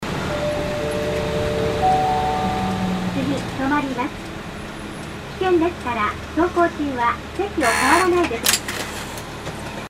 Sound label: bus tape orgel; kansai; narakotsu